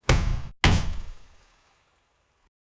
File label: boxing sound effect